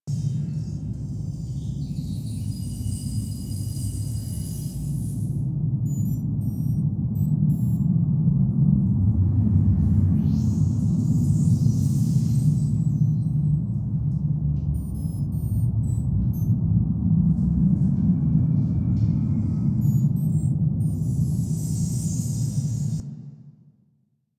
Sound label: Personal Quarters Amb; Warframe; Liset; Hub